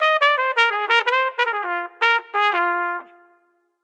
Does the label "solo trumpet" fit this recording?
Yes